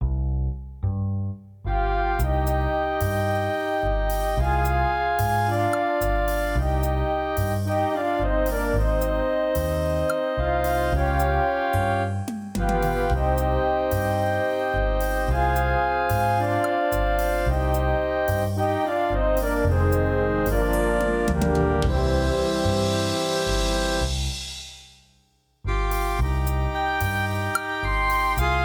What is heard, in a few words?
orch; Hear the passage without any voices; 2025 Kids Court